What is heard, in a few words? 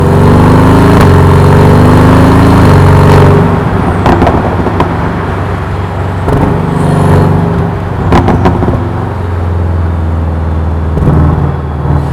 engine